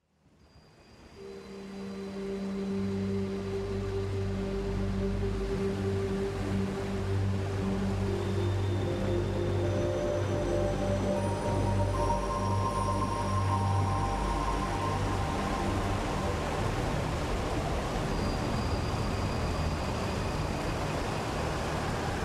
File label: Relaxing Sounds of Nature